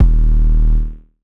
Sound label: bass 808 bash F; Polyend; ARC Noise